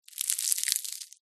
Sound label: horror gore body part break snap